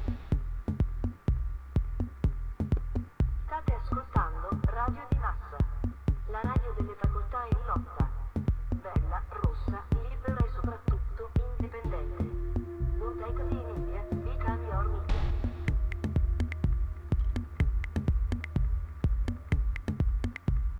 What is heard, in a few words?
jingle; radio di massa